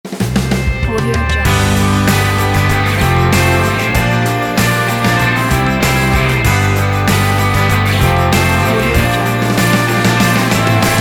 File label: inspiring rock ident